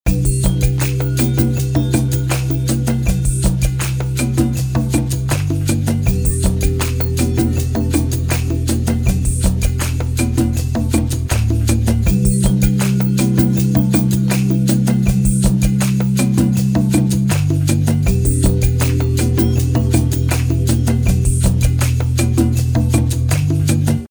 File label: loop inspirational